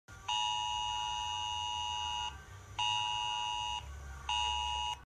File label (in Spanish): Prueba de sonido. Alerta en móvil